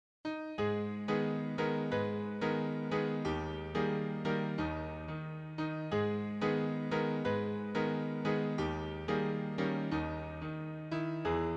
accompaniment, piano

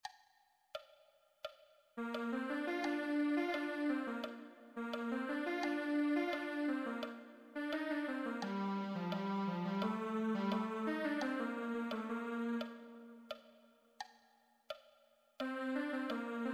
viola

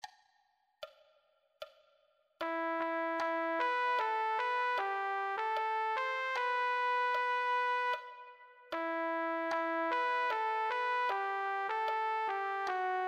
trumpet

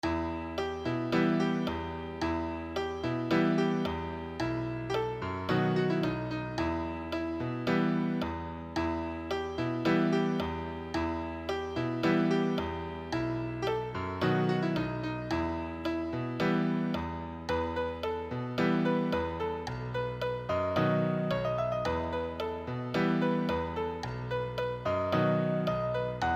solo, piano

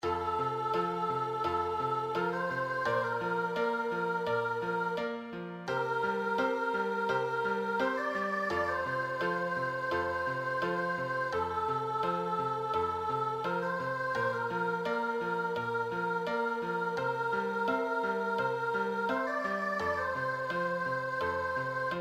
accompaniment

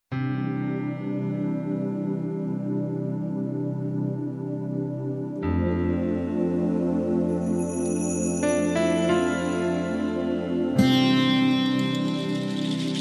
backing track

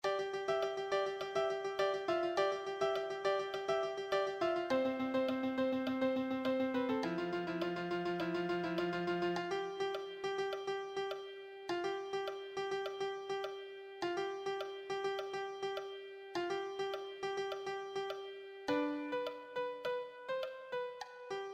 melody

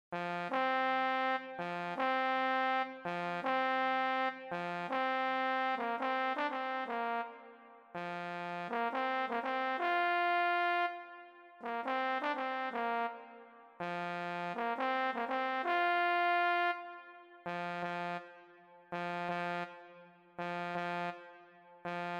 euphonium

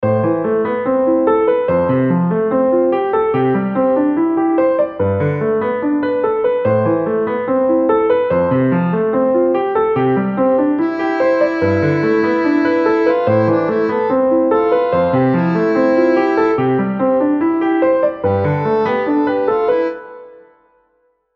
accompaniment, piano

